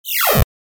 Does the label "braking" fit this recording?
Yes